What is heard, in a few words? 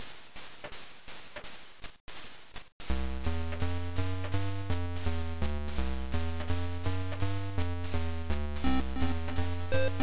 Music Jazz; Magic Sound